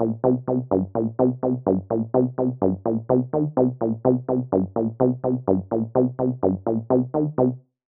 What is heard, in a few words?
126BPM Seq Root C, TechHouse; Offshore; Vengeance Samples; Bonus TechHouse Kits